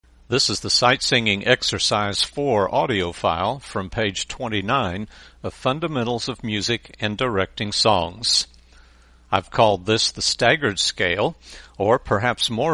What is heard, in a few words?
Sight Singing